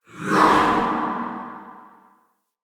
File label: Ghost Soldier Attack, Vocals; Enemy; Wandering Ghost Soldier